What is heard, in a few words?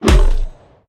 Punch; Baron